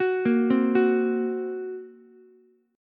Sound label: A sharp Suspended 2nd Sharp 5th A sharp, Ukulele; arpeggiated; A#sus2#5; JGuitar